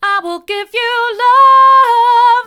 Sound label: mid, vocal